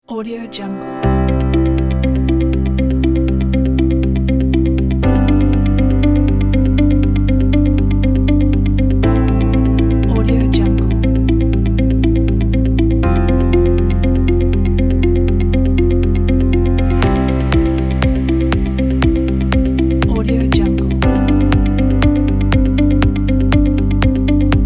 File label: perfect corporate